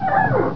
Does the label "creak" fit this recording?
Yes